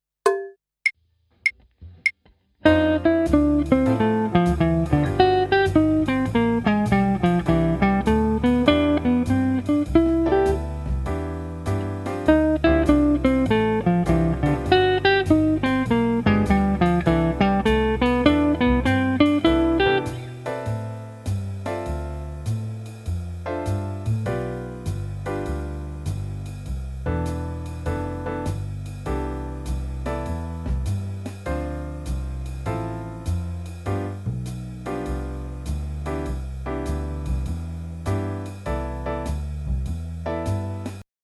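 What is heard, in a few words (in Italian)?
Jazz Guitar modi, Scala maggiore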